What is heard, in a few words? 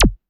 Beam HiQ; Drumset 02